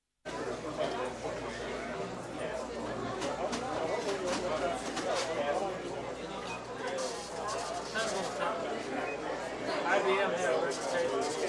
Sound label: crowd